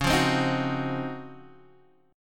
Db Major 11th, Guitar Standard; strummed; JGuitar

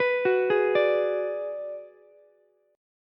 Ab Minor 7th Flat 5th Ab, down, Ukulele; arpeggiated; JGuitar

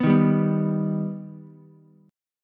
G Augmented G Ukulele; G+ strummed; 4,3,3,2; JGuitar

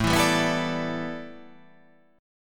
A 7th Suspended 2nd Suspended 4th

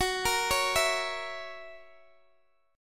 Gb 7th Gb down Guitar Open D, chord

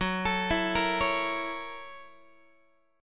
F sharp 7th Sharp 9th, Guitar; F#7#9; x,14,12,14,14,12; arpeggiated

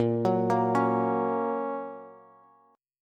A sharp Minor Sharp 5th, down, Bass

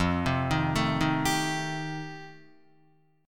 F 9th F Guitar, chord; arpeggiated

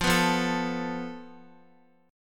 F Major Flat 5th, Guitar; FMb5 strummed; JGuitar

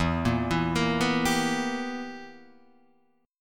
B Major 7th Suspended 4th Sharp 5th E

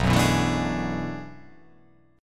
B Minor 13th, chord; Bm13 strummed; JGuitar

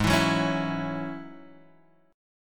Ab 7th Suspended 4th Sharp 5th Ab down; Ab7sus4#5 strummed

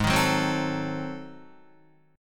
Ab 7th Flat 9th Guitar, chord; Ab7b9 strummed; JGuitar

